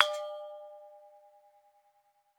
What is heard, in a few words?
Bonang Para EQ, Gamelan